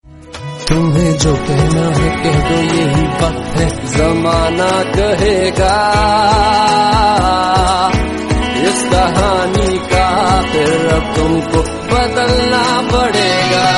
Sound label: strings